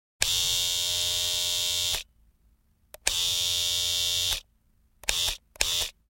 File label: Electric Shaver Multiple on & Offs, Household